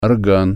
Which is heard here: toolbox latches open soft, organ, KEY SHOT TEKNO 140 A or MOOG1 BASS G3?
organ